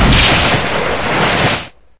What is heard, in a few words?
enemy shot